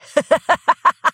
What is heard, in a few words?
laugh